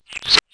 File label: spooky; Gonner